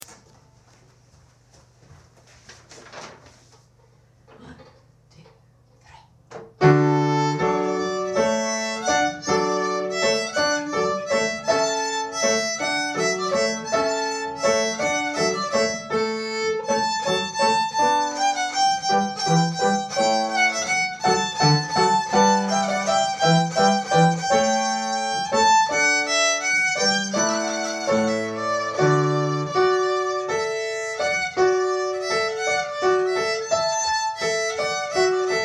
hornpipe from handels water music

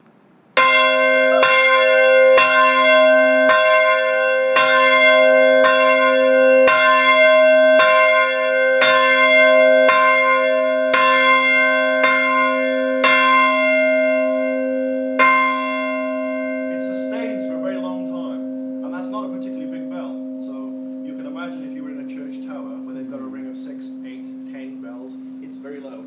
big bell at whitechapel bell foundry

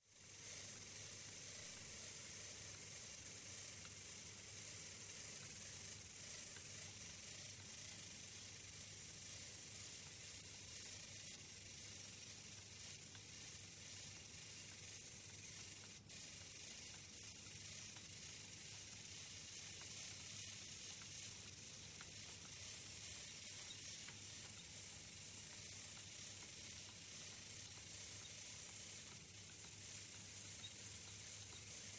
1871 treadle operated dentist s drill

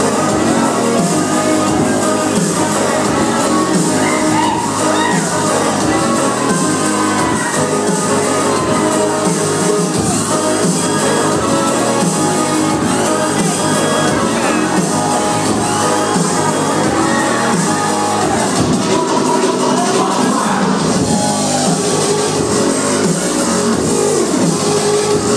wub wub wub

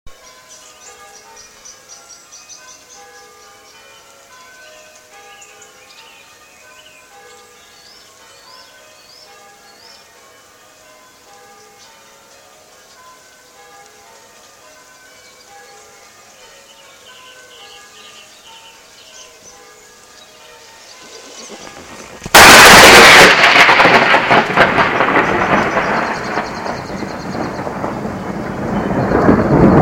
thunderstorm rain church bells; offwell devon

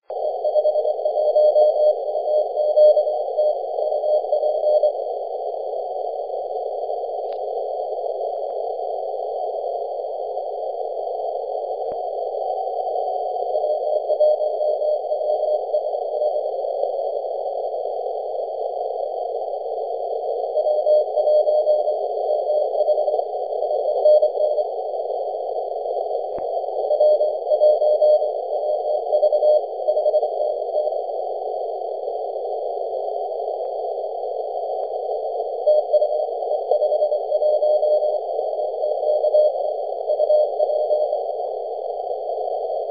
3 511mhz cw

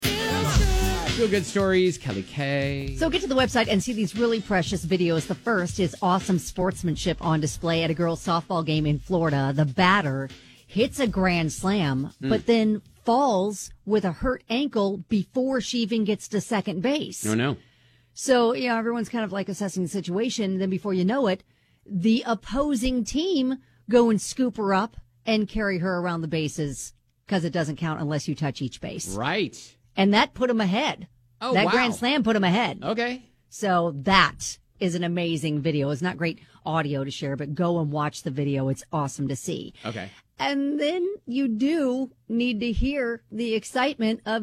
toddler excitement over a toothbrush

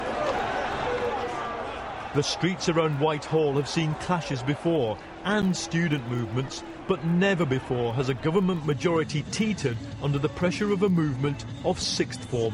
dubstep

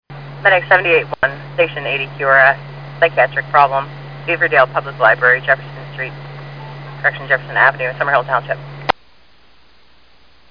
township page received; summerhill township vfd

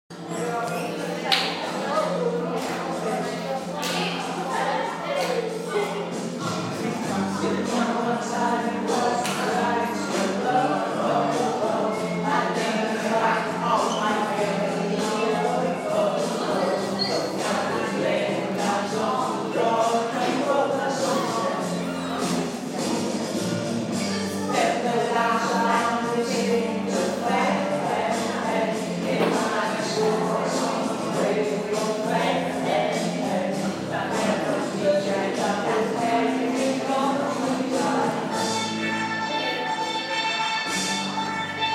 school choir

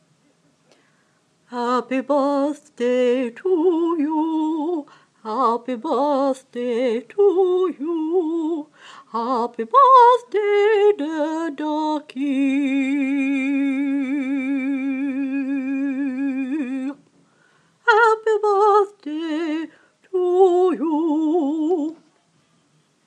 a small duck

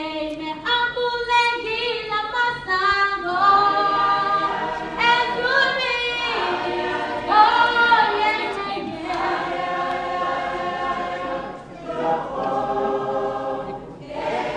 choir from Zimbabwe at a friends wedding

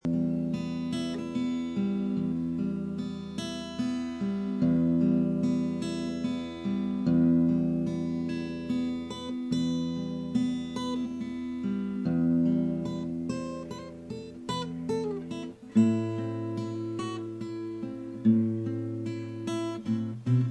acoustic guitar; testing audioboo with the acoustic guitar

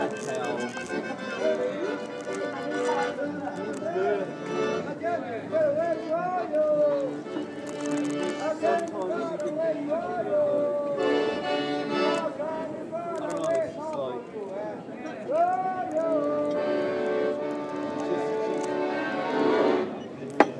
Italian restaurant accordion

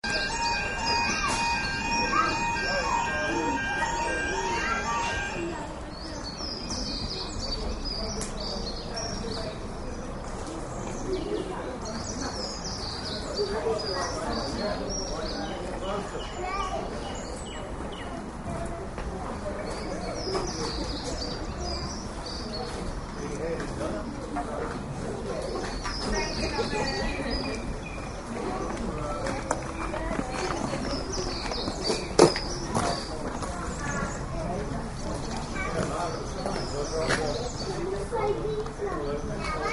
level crossing and birdsong at saxmundham station

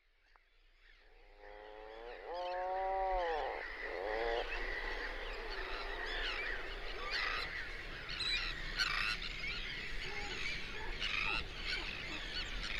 inner farne soundscape

